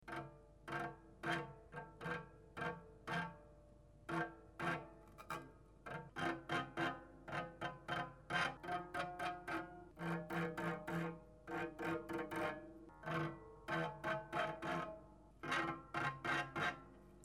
archet grince, harpe; touchers de la harpe

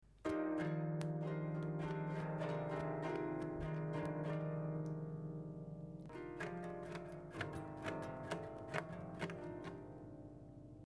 chiffon, touchers de la harpe

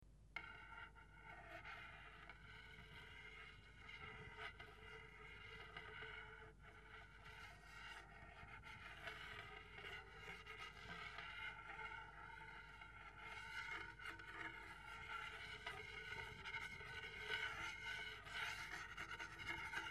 baguet table, touchers de la harpe chinoise

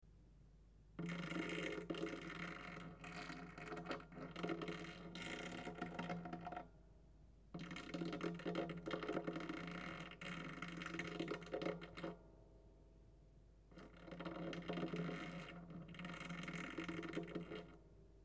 peigne, harpe

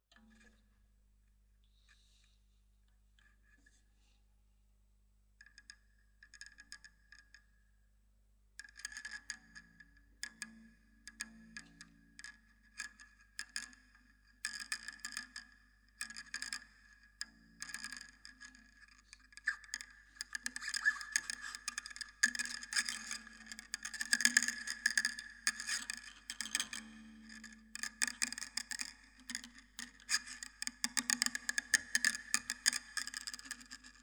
harpe acousma sur lololoillieu et cloches

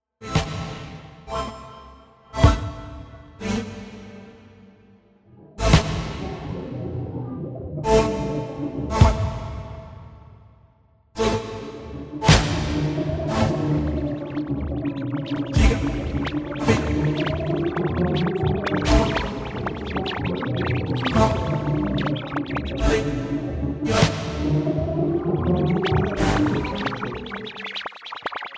pulse

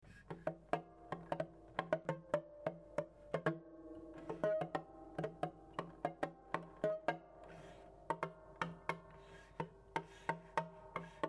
gouttes, harpe

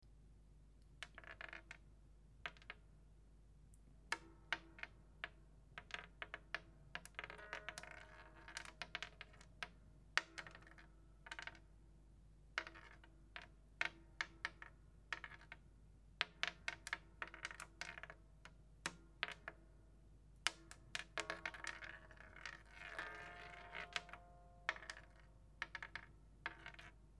crin, harpe

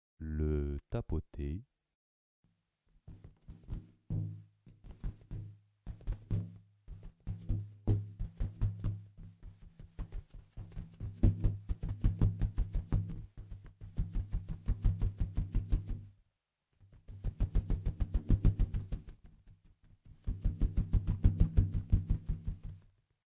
tapote

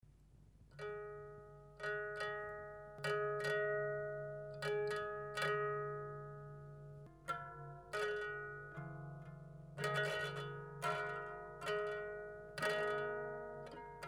pince, harpe

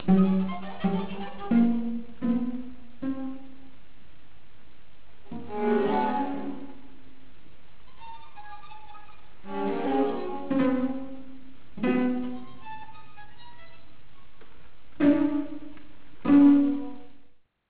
sextuor

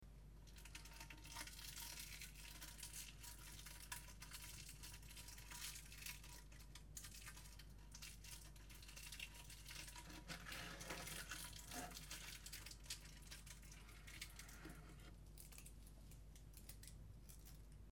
papier

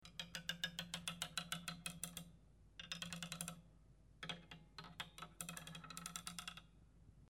tige roule bois, touchers de la harpe

